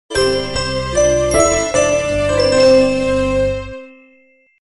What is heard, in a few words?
Power down; Motorola SLVR L71